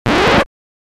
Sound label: dj bad needle drop sound effect